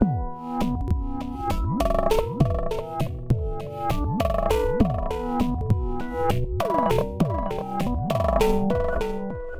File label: Modern Beat; psybient transmissions